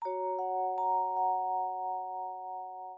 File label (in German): Kuckuck Dur langsam